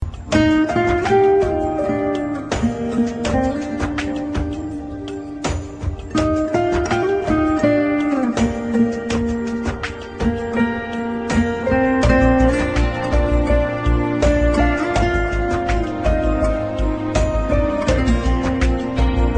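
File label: sad guitar instrumental ringtone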